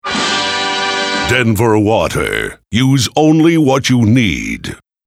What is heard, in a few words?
Orchestra